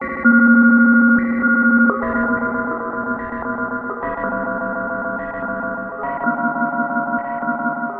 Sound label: Shutter Film, FM Radical Manipulations; Sonic Foundry; The Electronic Music Manuscript Collection